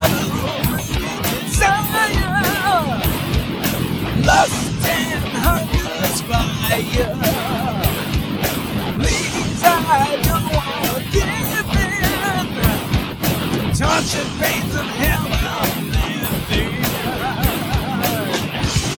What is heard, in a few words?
percussive, Phase based harmonic percussive separation; reference algorithm